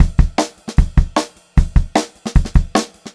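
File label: 152 speed 2 variation, pop rock drum loops; USB Soundscan vol.37; AKAI; 90 s Sample CDs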